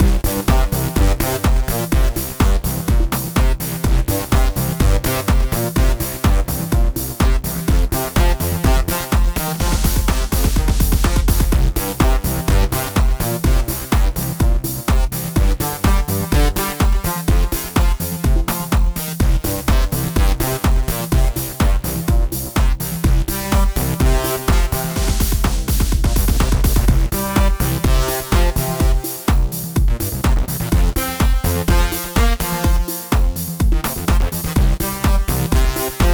Grunge Street, sci fi

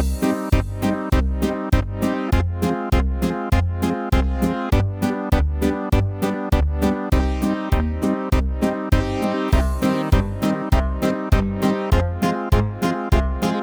Quirky Coin Op Games, chiptunes

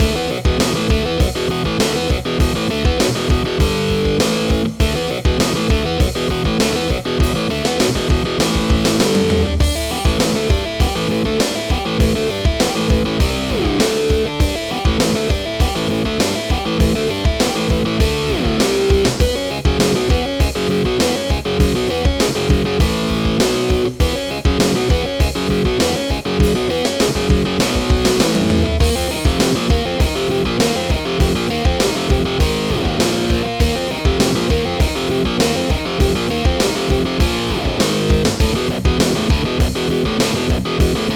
Guitar Mayhem; action

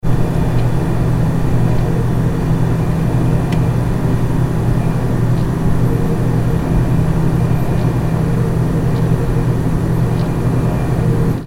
Inside Refrigerator

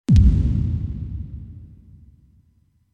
Creepy Percussion

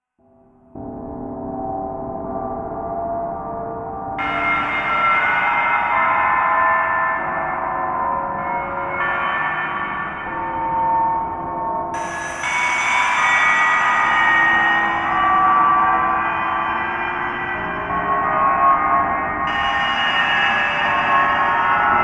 Shattered Mind Lo Fi; Horror/Surreal Music

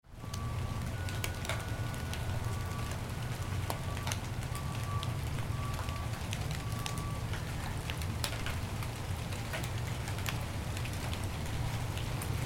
Light Rain Thunder

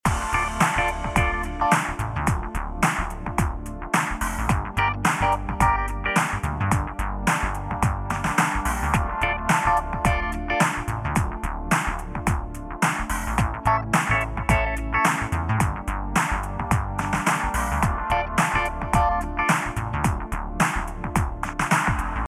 Cool Menu Loop LoFi

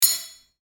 Clank

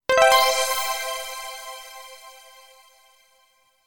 Power Up

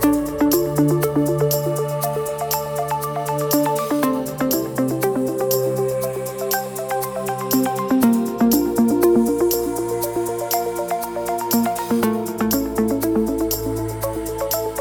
Magic Snowfall, fantasy

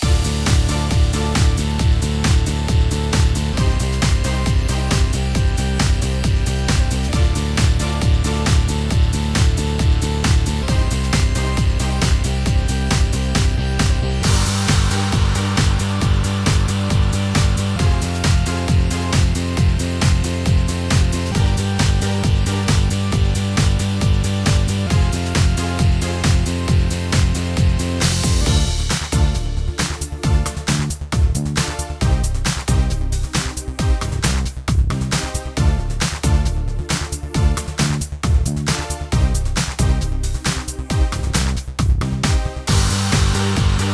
Boss Battle, action

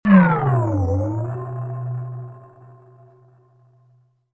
Power Down

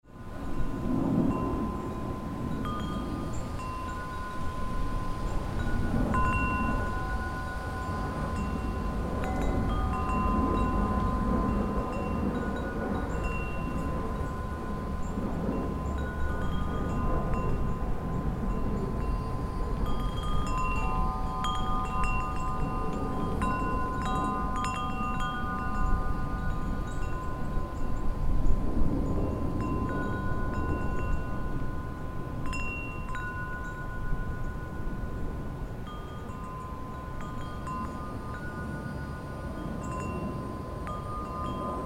Wind Chimes Day Ambience

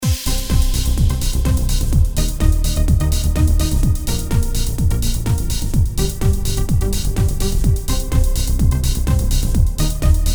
Techno Caper

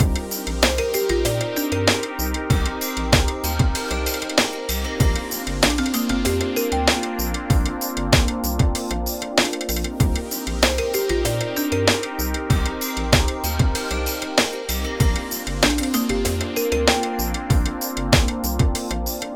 Balloon Fantasy

